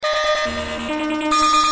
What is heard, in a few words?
Noise, ringtones; Google; NewWave Labs